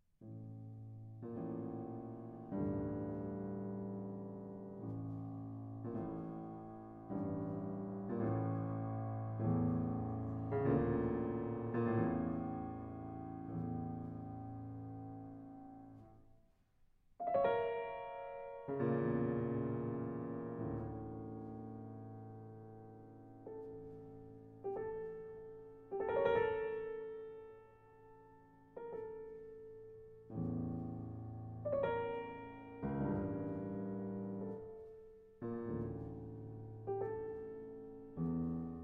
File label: Kaleidoscopes For Piano, Lentissimo e Languendo; Onovwerosuoke; No. 4